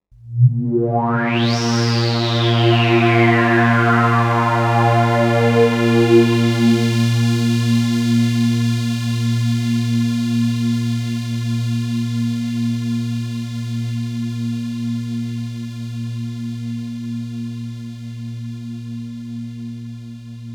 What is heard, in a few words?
Oneshot Synth